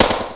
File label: pistol; ARCADE; NOS